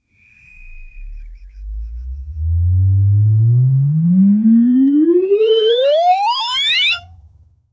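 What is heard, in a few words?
ZF ecomat 5HP transmission, machine operation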